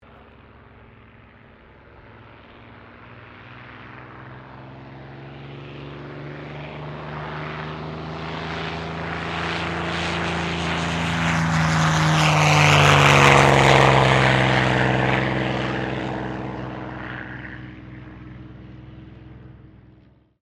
AIRCRAFT SUPERMARINE SPITFIRE MK-XVI TAKEOFF STEREO; Airplanes; Sound Effects:Vehicles